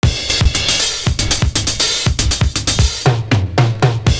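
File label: HIT THE SNR, 119 bpm; Univers Sons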